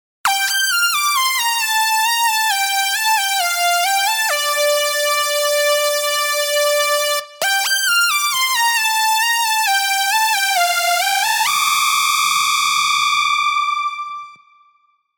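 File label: synth, Serum supersaws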